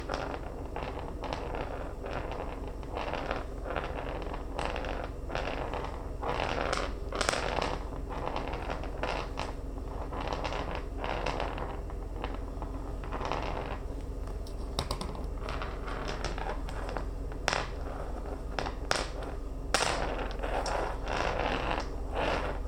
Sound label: Rolling Marbles